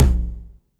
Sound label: tom right